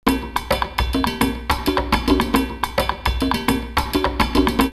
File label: Recife 106, drumloops World & Ethnic; X-Static Goldmine